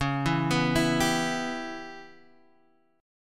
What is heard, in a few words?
C sharp Minor 7th Flat 5th C sharp